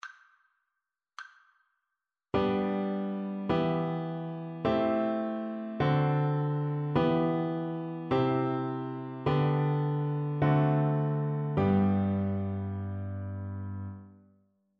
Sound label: harmonic dictation